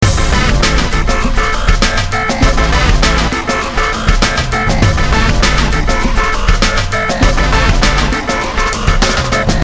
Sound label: loop